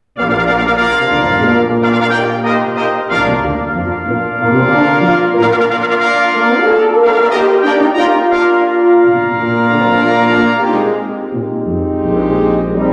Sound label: Fanfare and Flourishes, brass